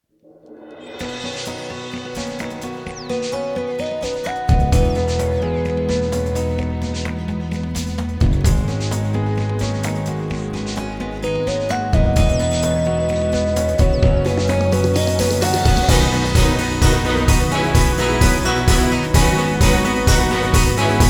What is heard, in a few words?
brandsong; emvarias